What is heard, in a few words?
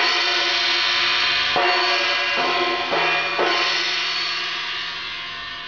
sabian 15in pentagon prototype cymbal